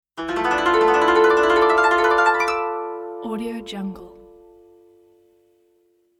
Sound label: japanese koto scale logo